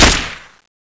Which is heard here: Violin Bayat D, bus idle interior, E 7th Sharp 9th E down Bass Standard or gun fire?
gun fire